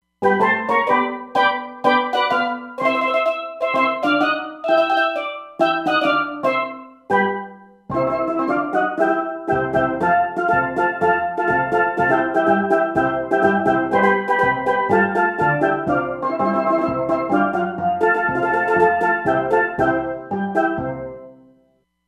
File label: 50`Steel Drms, Roland Fantom XR